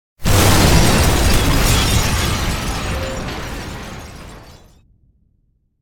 Explosion Heavy Glass Destruction; Hollywood Edge; sound designer's toolkit v2

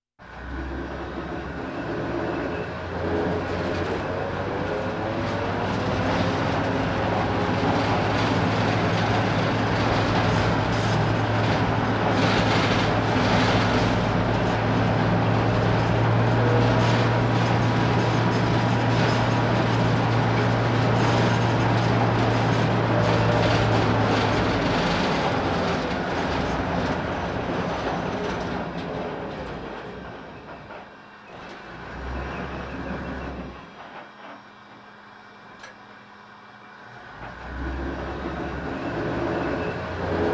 Military vehicles

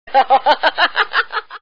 laugh; Dice World

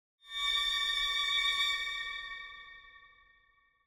Symphonic Hit Thin Dramatic Finale; Hollywood Edge; sound designer's toolkit v2

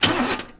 Auto Ignition Quick Turn; Automobiles

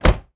Car Door Close; 1001 Sound Effects

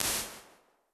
SNARE REVERB 2000, Impulse Responses; Reverbs; PastToFutureReverbs; CLA; IRs

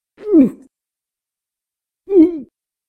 about To Drown; RadwinIsland

